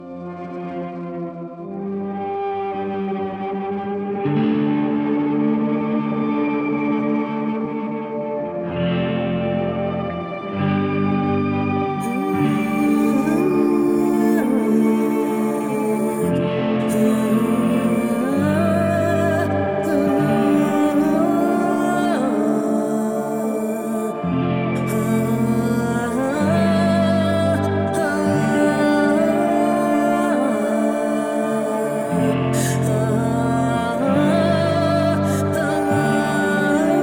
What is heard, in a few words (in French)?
Burrhus avec Gtr